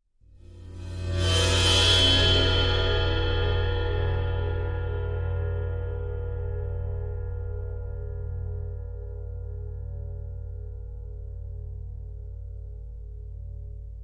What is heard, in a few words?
18 Cymbol Bowed; Sabian 18 Cymbol Hit 1